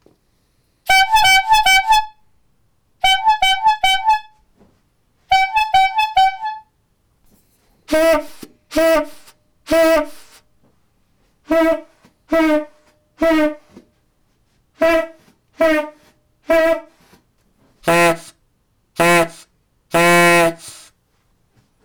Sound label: squeeze horns Collection; Mono